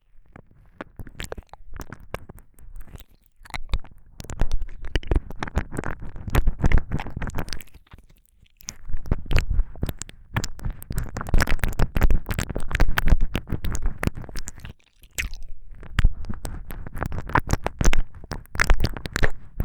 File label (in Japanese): 奥までぐりぐり耳舐め, ASMR